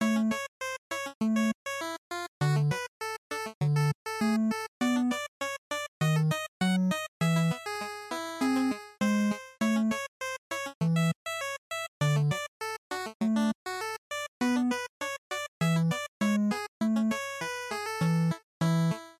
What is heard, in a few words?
NES General Stage Loop 100